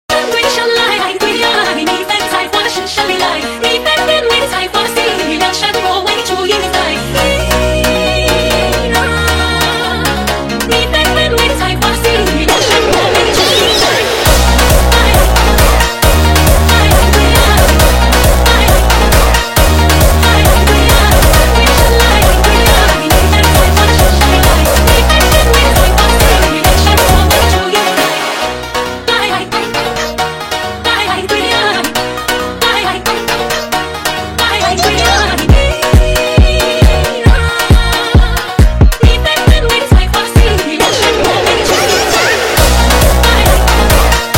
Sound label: Echoing Jumpstyle sped Up